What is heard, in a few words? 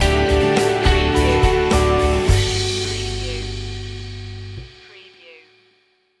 feelgood morning stinger